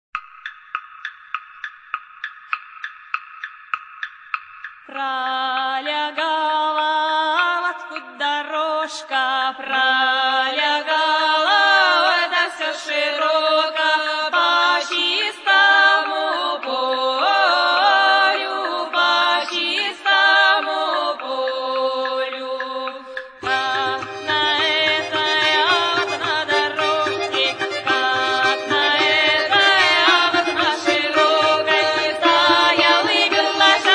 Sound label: cossacks marching song